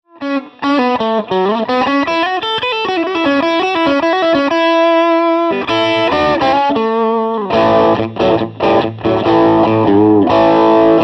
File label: New York Blues